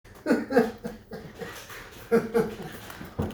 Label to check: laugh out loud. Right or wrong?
Right